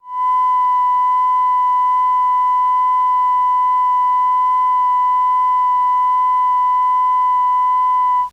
Neg 1Khz

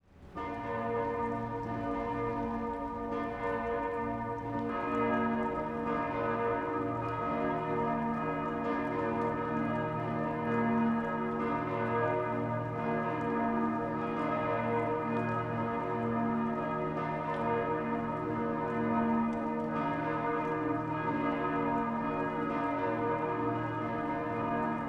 Two Bell Shorter, Salzburg Bells

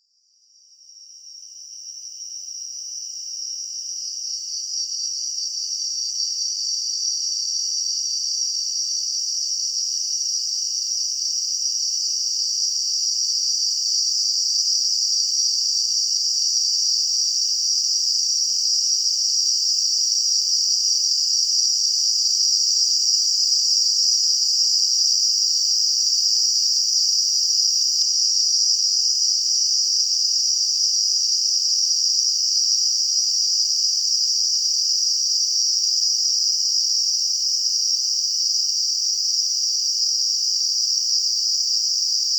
Cicadas, Garden

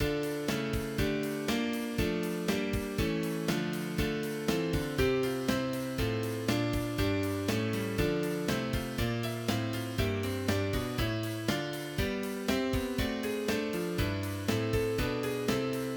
w drums

